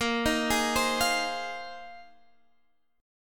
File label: A sharp 9th Suspended 4th, A#9sus4 chord; arpeggiated; JGuitar